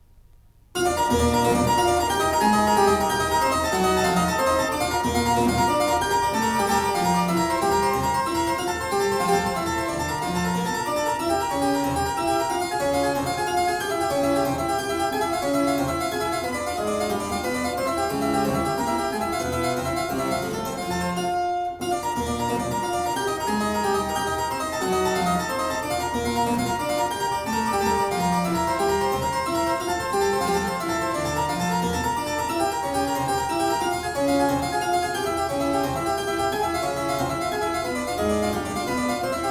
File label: Gigue, CLAVIER; PARTITAS; Partita No.1 in B-flat major; BWV 825